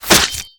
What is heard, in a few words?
Sword Skin, Damage